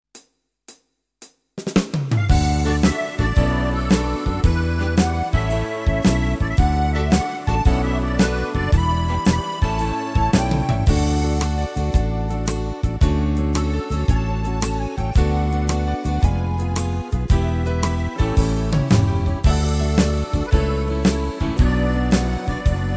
Paris Shuffle; tyros2; Dansktop

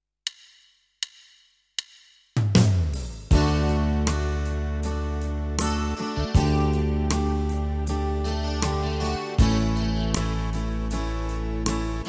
Slow Folk; tyros2; Modern Folk

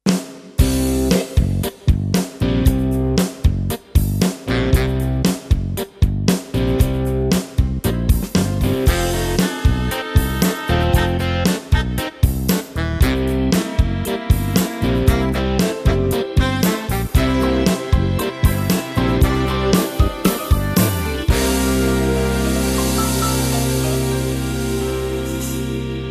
Dublin Rock; tyros2; Blues Soul

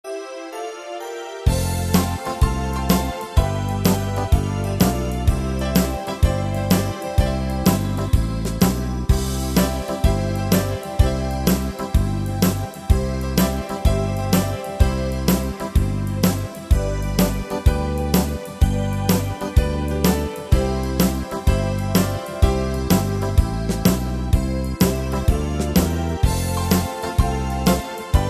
Mid Shuffle, tyros2

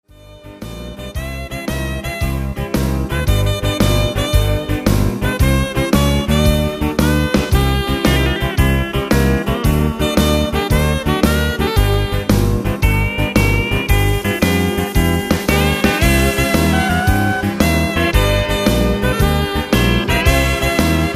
Tyros 4

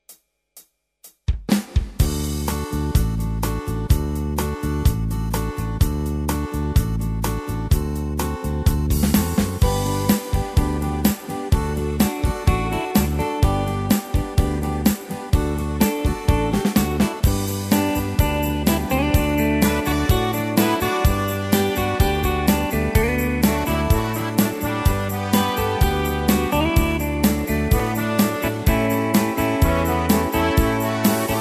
Bamse Beat; tyros2

